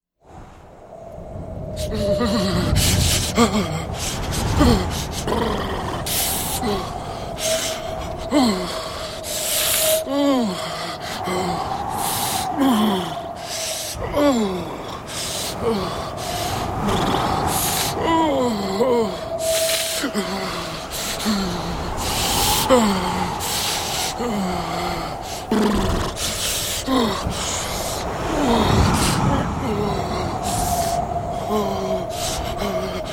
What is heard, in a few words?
Shivering Man, Man out in the cold wind shivering.; Miscellaneous Human